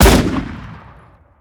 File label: Gun Sniper Rifle Shot; SFX Kit; Weapons